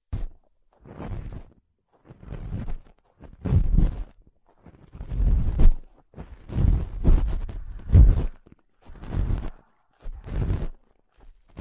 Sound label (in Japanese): 耳かきオンリー